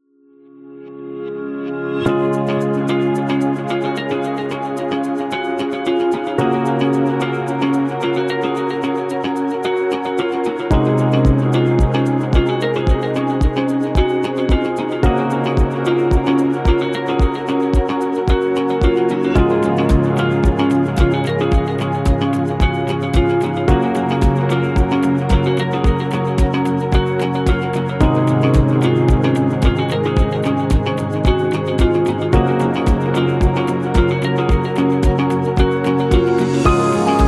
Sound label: Corporate Motivational Uplifting Positive, corporate music; corporate music vol 15